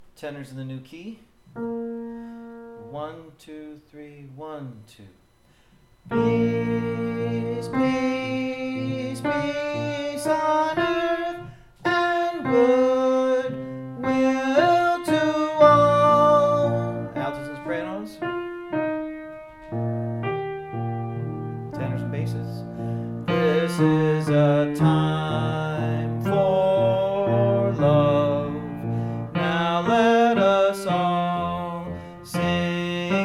Tenor in Bb, choir parts

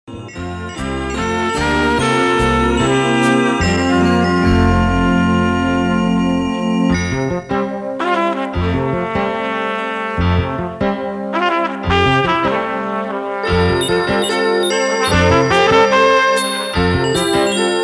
flugel

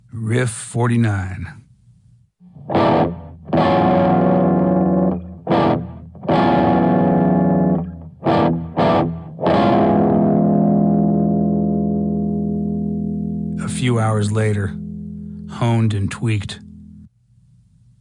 Riff

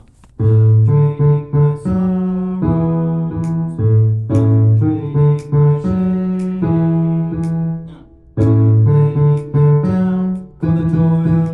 bass, choir parts

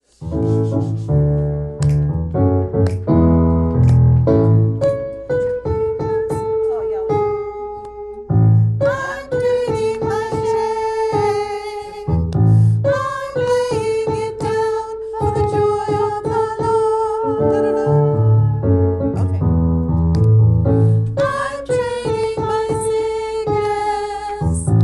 soprano, choir parts

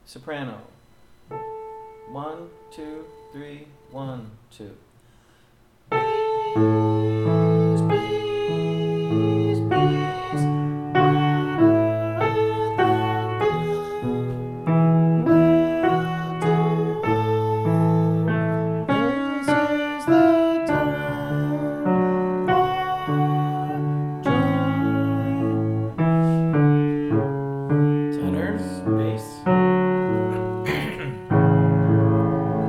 Peace, Peace (Sop in A), choir parts

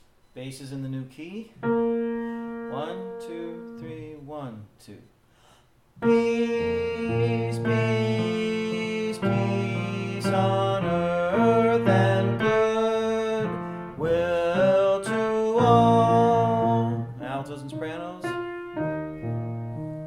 Bass in Bb, choir parts

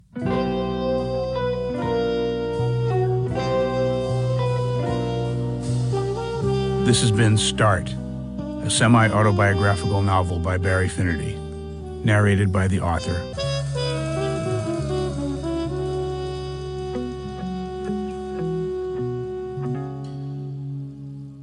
Concluding music

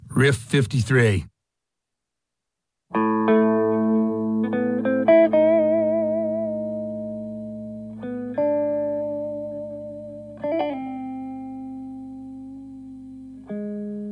Riff

